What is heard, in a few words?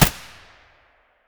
aps muzzle distant; STALKER; IWP